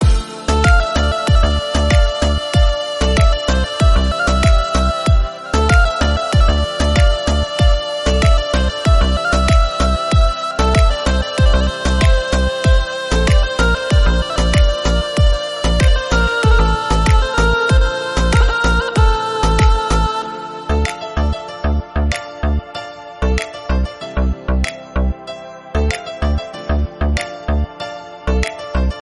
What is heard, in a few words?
Turkish remix song musical tone ringtone